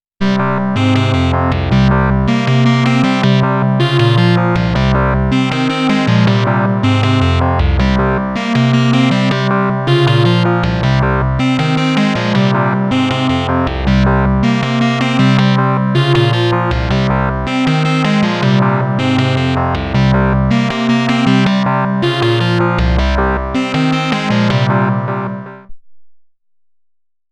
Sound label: Dreadbox Polyend Medusa Dubstep